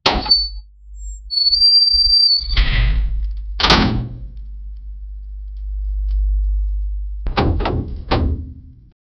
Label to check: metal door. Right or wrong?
Right